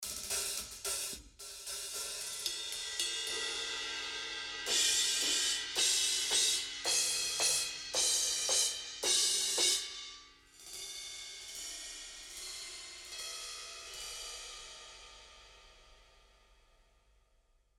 cymbals, punk rock kit; wild boar; klangmacht

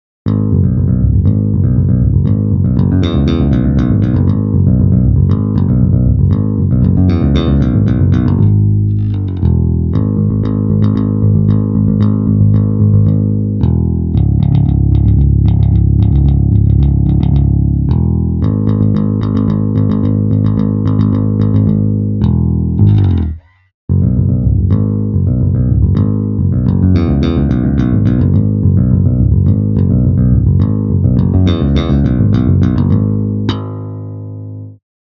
finger bridge, rock bass; virtual EII BTL MIDI rock bass; pfundstein audio plugins

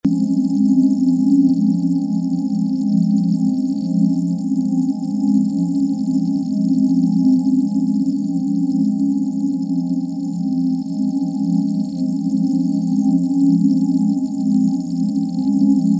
synth halide

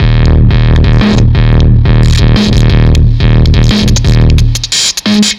Fuzztest drum broadcast

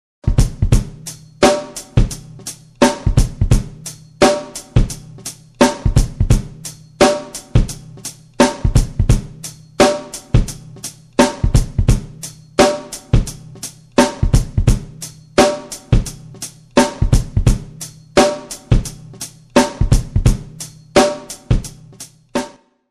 drums clean

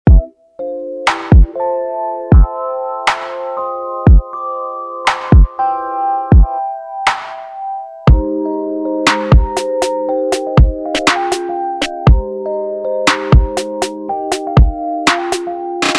tape wobble beat; warmth dev

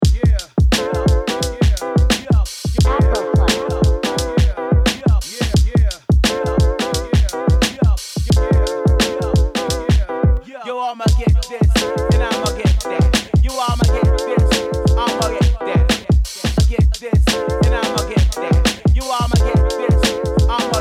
afro keyz hip-hop

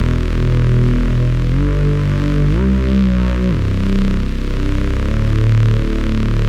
perfect bass saw; ultimate vital bundle; rocket powered sound

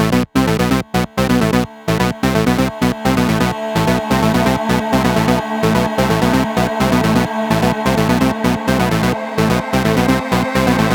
hybrid pad and saw; porphyra hybrid; ocean swift synthesis

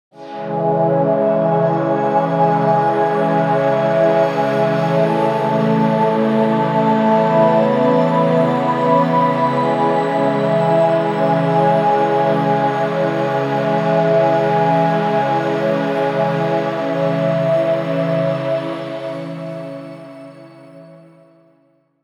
evolving voi; porphyra hybrid by ocean swift synthesis

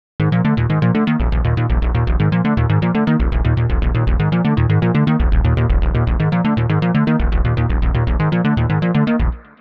biquad filter; the analog sound waves

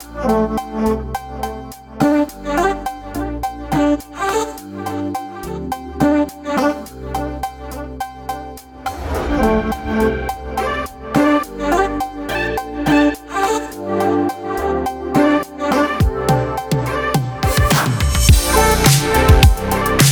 peruvian 105, loop; tigri; elitist loop; ava music group

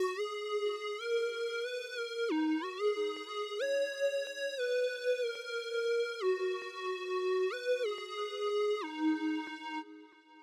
mob bounce lead; player vst; sound selection kits

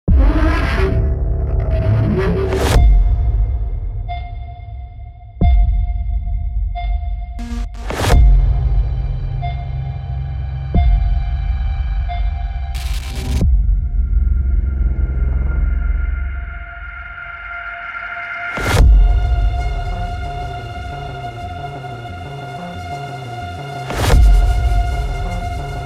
amped mangled guitar; sampletraxx

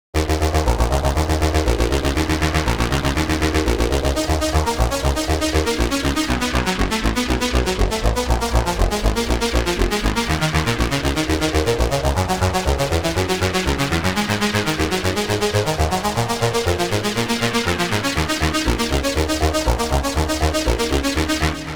ununoctium arp; the space soundset

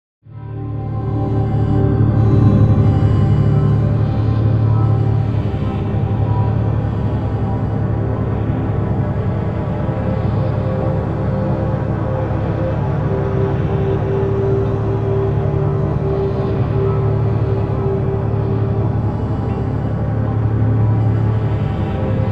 depths of mo; porphyra hybrid by ocean swift synthesis

